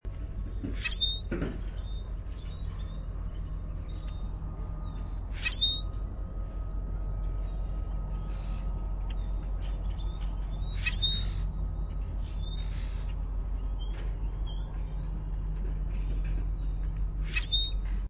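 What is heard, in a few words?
squeak, Common Grackle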